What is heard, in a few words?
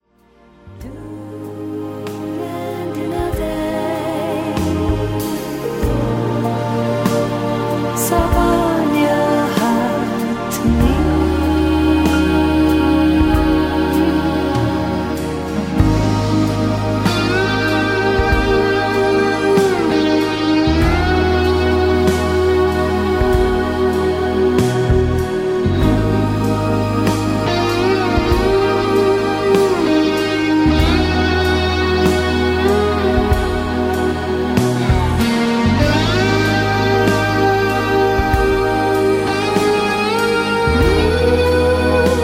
Warm Waterfalls